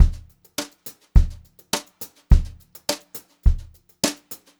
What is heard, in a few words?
BEAT, Brushed Drum Loops